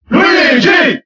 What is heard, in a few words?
Cheer French; Luigi; SSBB